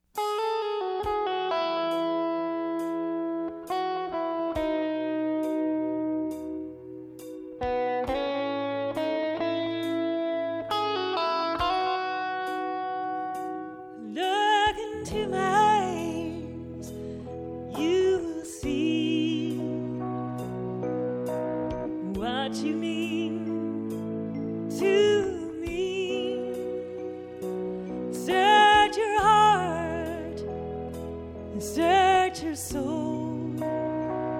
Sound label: solo